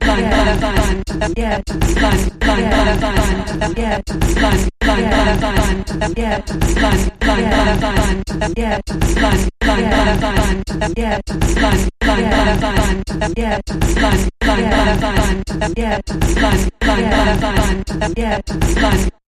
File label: Sampled Voice Percussion Variation Medium Version